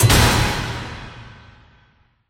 panels fall; scripted sequences; eli rescue

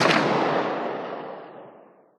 mac10 distant, weapons; Counter Strike Model Pack; CS2

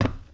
glass, footsteps

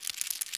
rodent scratch short; ambient animal; Counter Strike Model Pack; CS:S

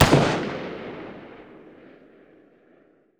sg556 distant, weapons; Counter Strike Model Pack; CS:GO

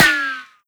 npc armor, bullet impacts; physics; Half Life Model Pack

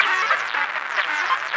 radio random, prison; Half Life Model Pack; Half Life 2; ambient levels

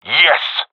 cheer, seal diver; Source Model Packs; Counter Strike Model Pack; CS2

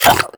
grunt, headcrab, creatures; headcrab classic; vox

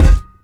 physics cube sm; Portal 2

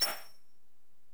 ui rollover; Half Life Alyx

